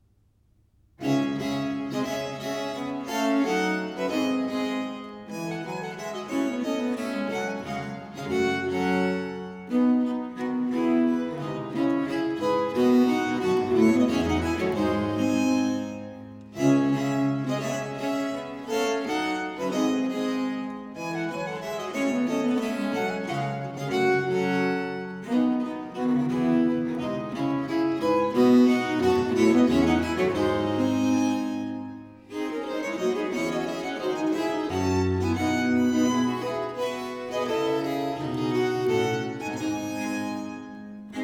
suite no. 1 in four parts, in d major iv. sara, english music for viols